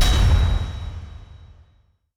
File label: stinger short; ui; dota